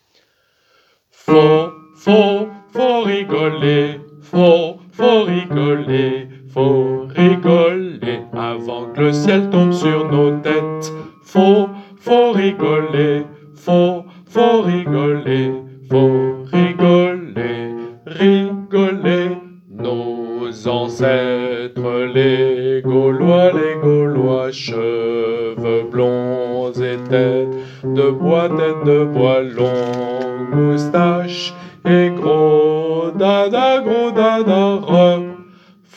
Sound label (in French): hommes